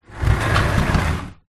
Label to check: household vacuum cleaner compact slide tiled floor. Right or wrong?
Right